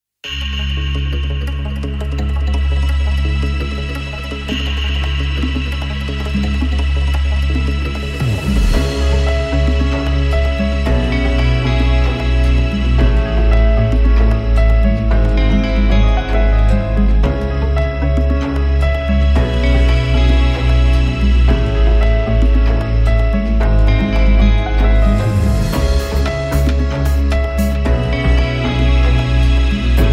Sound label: off vocal